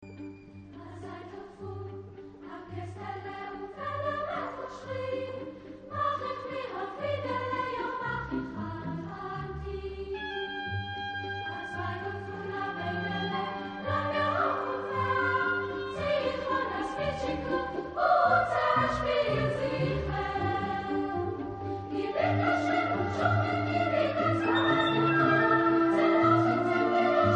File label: Symphony nr. 6 Allegretto; Moise Weinberg